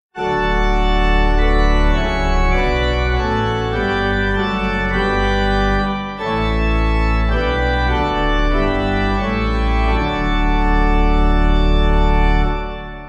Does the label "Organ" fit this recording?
Yes